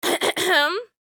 Human Female Cough Clear Throat; Clearing Throat

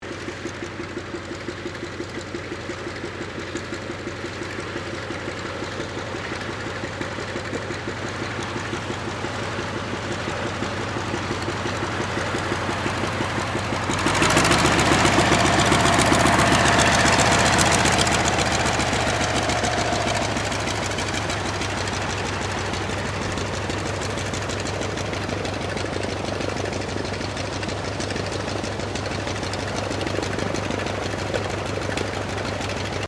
AIRCRAFT CAC WIRRAWAY TAXI STEREO; CA-16 Wirraway; Airplanes; Vehicles

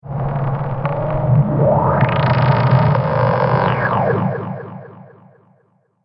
Evil Alien Energy; Alien Sound Effects

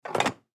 Chair Metal Fabric, Chair Metal Fabric Stack Up; Chairs; Household; Blastwave

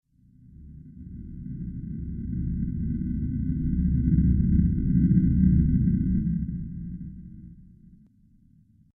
Deep Space Motion, Science Fiction Sound Effects

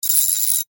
Industry Ratchet Turn; Hand Tools

